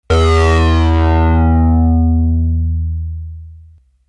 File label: trance